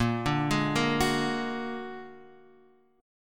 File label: Bb Augmented 7th Bb, Bb+7 chord; arpeggiated; JGuitar